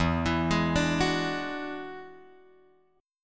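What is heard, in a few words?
E 9th E, E9 Chord strummed; E9 Chord {0 X 2 1 3 2} arpeggiated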